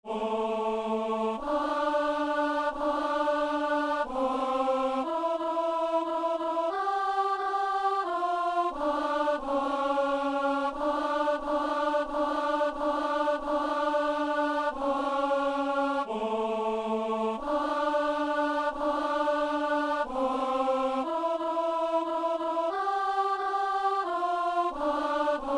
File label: alto; Messe de Saint-Jean - Agnus